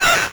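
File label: vampire bat hit; Crypt of the NecroDancer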